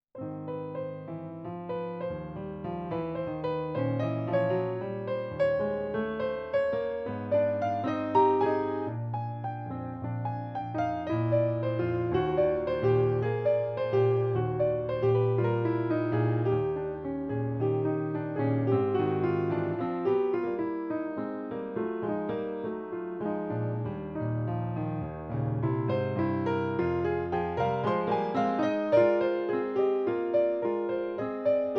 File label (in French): Valse; Mel Bonis